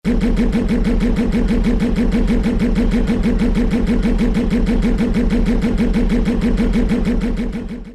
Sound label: podracer idling; sebulba